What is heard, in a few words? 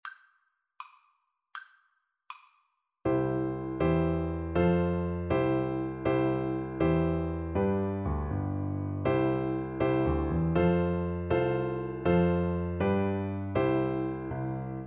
merry christmas BN, Bassoon